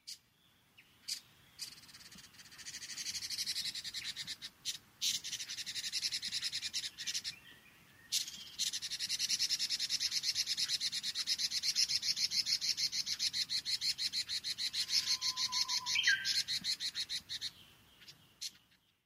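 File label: Red-billed Leiothrix, naganuma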